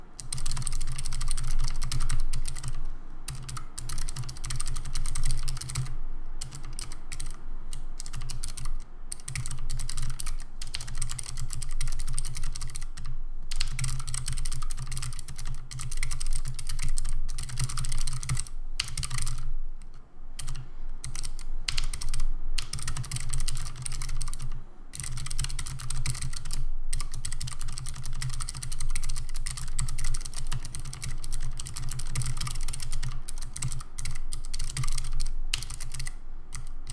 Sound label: Keychron K3 optical orange switches